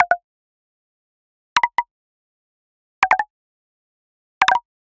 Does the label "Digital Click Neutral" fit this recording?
Yes